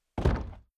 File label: Big Plastic Container Hit Deep Thud Liquid Movements; Low Impact Sound Effects